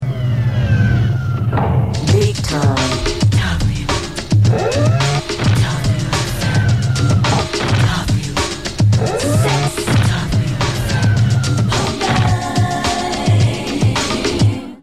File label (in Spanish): Bigtime Percusiones